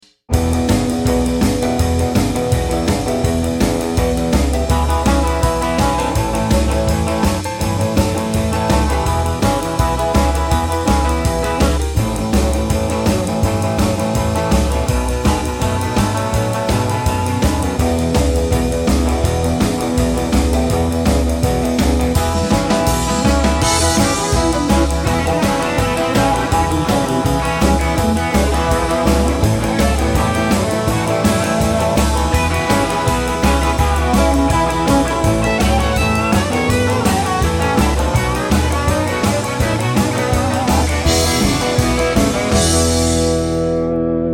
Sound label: Rockabilly Fast; Genre: Country